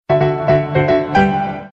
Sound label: Piano; Super Mario